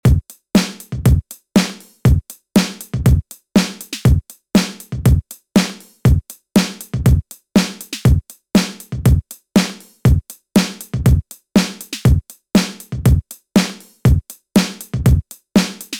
drums

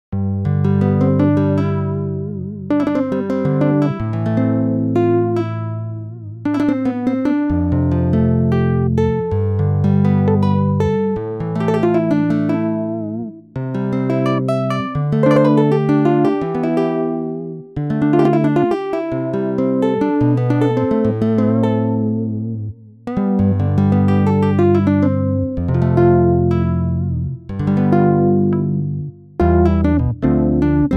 Nylon pluck; Saurus

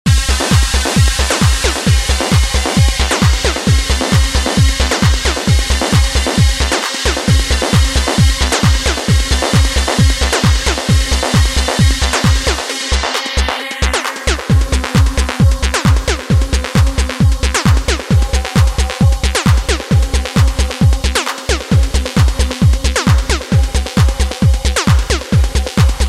zebralette various techno; zebralette various techno soundpack; edmsoundware

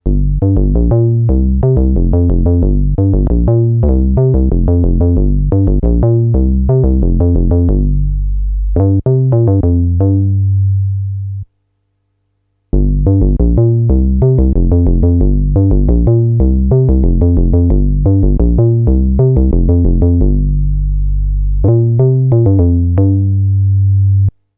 101 house bounce; strobe

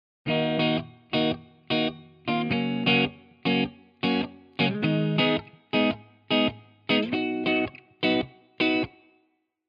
les paul wet; chiqui audio

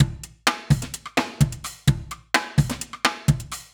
trash break hh

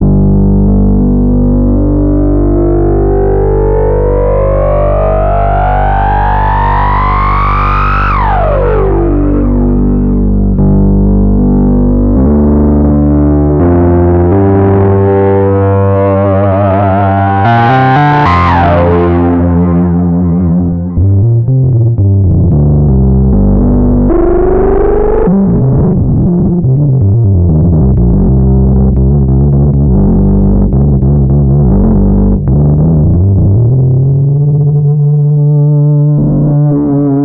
Feedback Psychedelia; Saurus

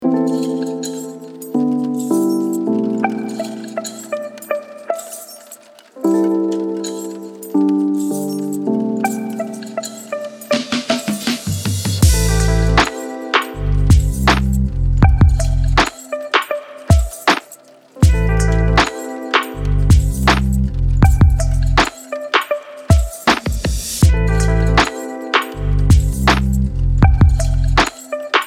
koi pond lo-fi beats; big fish audio

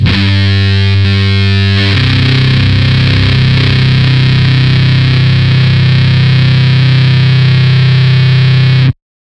gtr, Fuzz test; frenchtoast

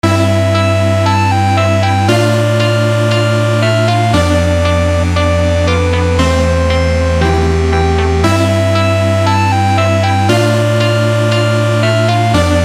broken carousel; theorem; hivetune

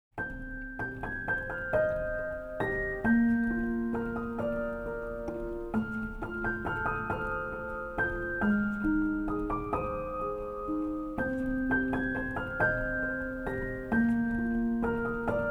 felt piano; aeval felt piano by dark fairytale instruments

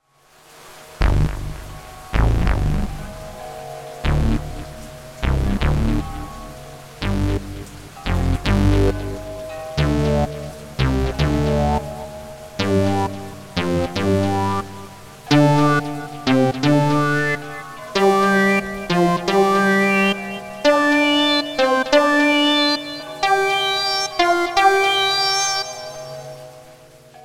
waka waka bass, horror synth; monstrous horror synth; by super synths

